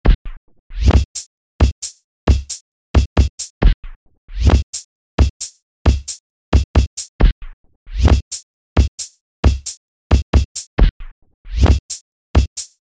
cool beat

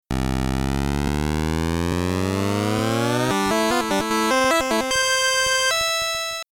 victory jingle

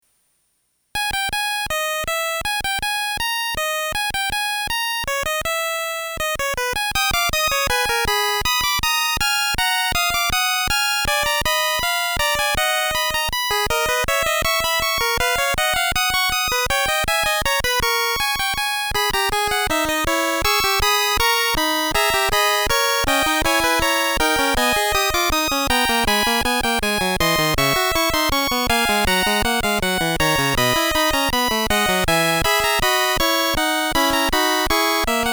fugue in c minor

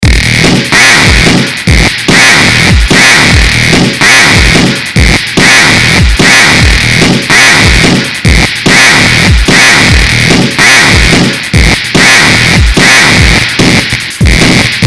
sawtooth

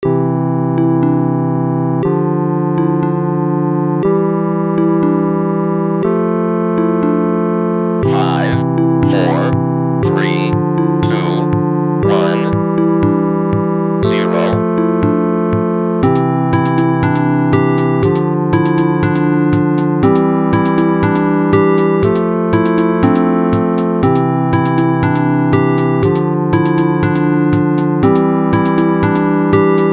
lo-fi angel portasounds and speak and spell

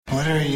session 8 bit

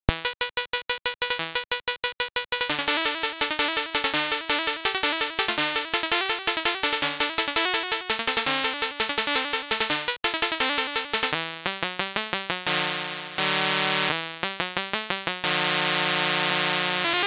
zombie walk 1bit

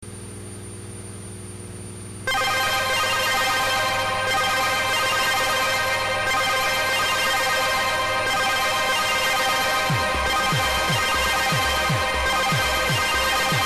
chiptune gameboy nanoloop moongaze minimal lo fi

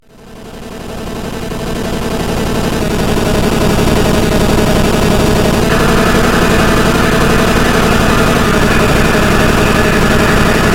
black metal

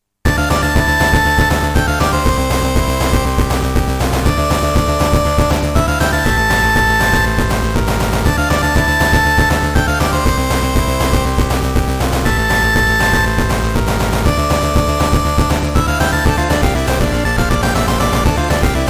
korg ds 10 pc engine test shooter style; turbo graphx 16

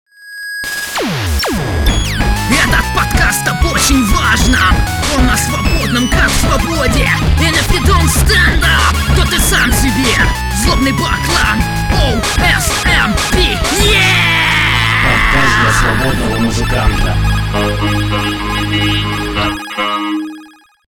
jingle

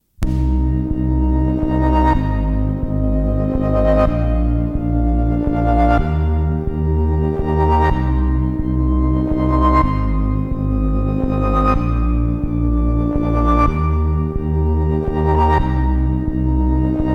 sid ambient c64 tape and reverb

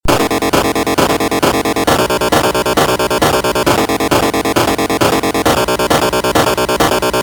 chirp

